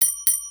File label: bell double